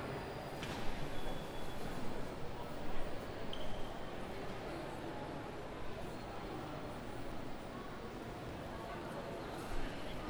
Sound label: ambience; intro and main menu ambient sounds; cube-tube